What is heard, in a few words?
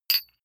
medium metal impact medium scrunch